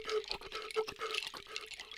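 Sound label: Music Village Calm Near; from dust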